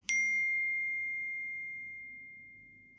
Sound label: message, phone